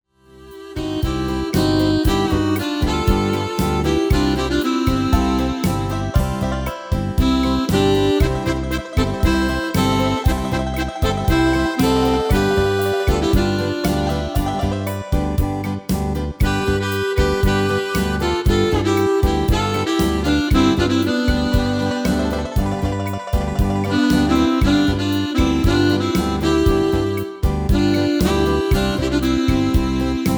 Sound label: MIDI